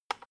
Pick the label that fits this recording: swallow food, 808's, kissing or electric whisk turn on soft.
electric whisk turn on soft